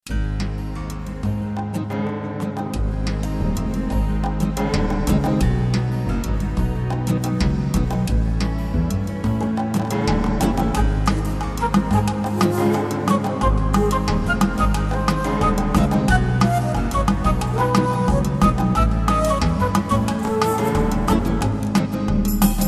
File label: Strumming